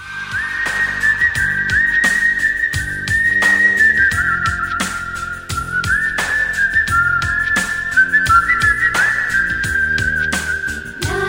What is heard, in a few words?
Telugu Whistle